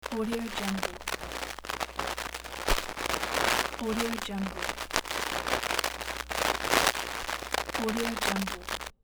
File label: static audio cable loop